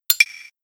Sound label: Shutter, Samsung phone; SGH-T369 Linx